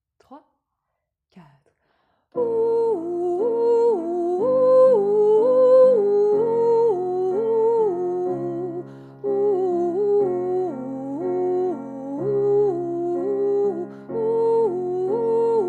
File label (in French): fin soprane